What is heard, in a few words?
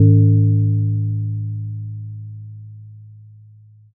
gong